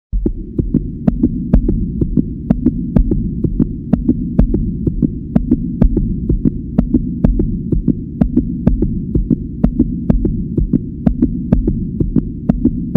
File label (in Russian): zvuk serdcebienija